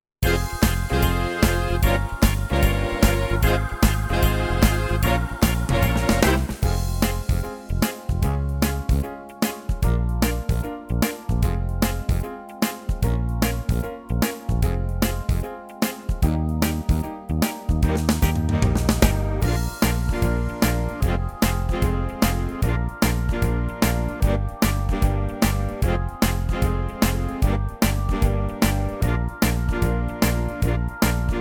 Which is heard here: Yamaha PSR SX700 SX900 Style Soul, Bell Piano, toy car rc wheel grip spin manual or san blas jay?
Yamaha PSR SX700 SX900 Style Soul